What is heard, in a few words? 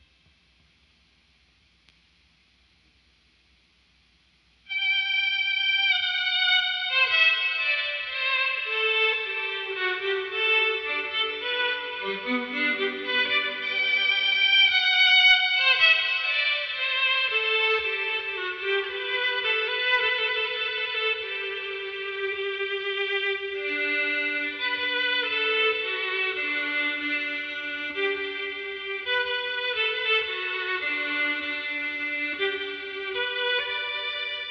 Wedding March Violin mix